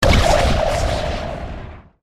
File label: dizzybomb use